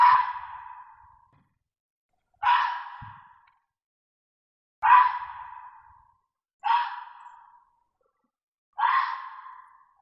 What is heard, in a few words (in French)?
Cri du renard